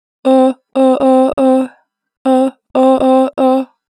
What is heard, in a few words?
Oh - 2 bar vib, Natural Vibrato, Vocals; Harmor Vocals